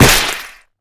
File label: whip hit